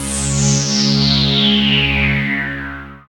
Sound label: SYN DANCE; Sound & Vision - Gigapack II